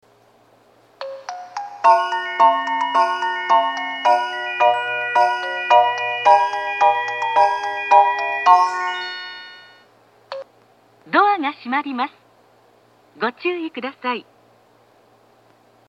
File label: minami koshigaya 2 bannsenn